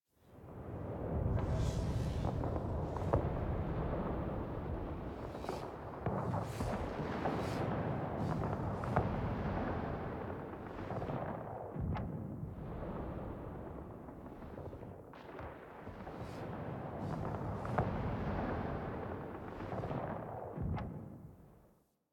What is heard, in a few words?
Moon Intro Ambience; The New War